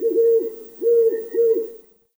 Night Creature Owl; Ambience; Night One Offs

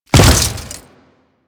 Step Flesh, Footsteps; Warframe; Grineer

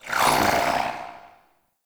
Queens Chamber Shield Activate; Ambience; Warframe; The War Within

